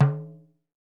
NIGERIAN, African Perc

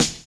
JAZZY; Univers Sons

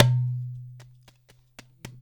dumbek; InVision Interactive; GRUNT

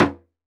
Surdo Mute, Percussion; Roland BK-5

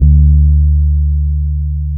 D 3, hood boom, bass; Spectrasonics; Bass Legends CD 1

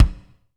BD, BD REAL

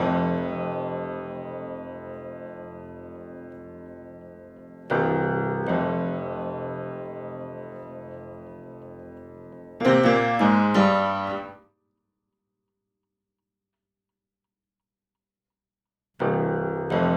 Piano, 70s Analog Funk; Sonic Foundry (Sony Creative Software); What it Is! 70s Analog Funk

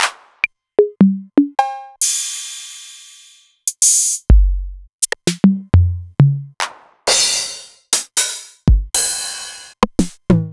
Novation Drumstation; DrumstationV1

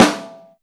SNARE, Ragga & Dub Reggae

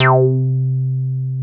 303 REZ C3, ROLAND TB303; Big Fish Audio; First Call Vintage Keyboards

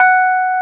F#4, RHODES EP2; Sonic Reality - Vintage Timetraveler

